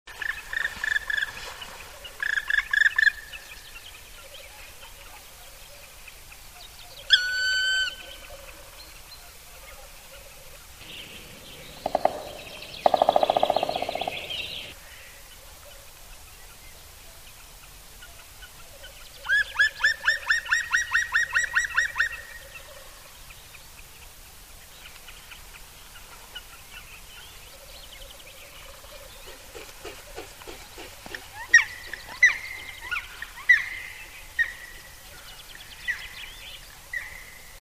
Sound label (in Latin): Dryocopus martius